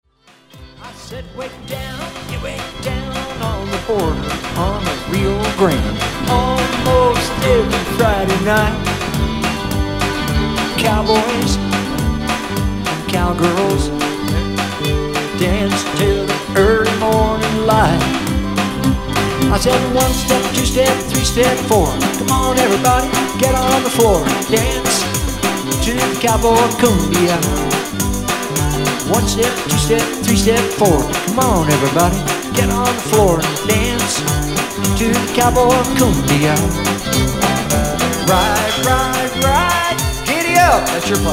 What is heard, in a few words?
cowboy cumbia